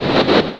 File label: motion; animal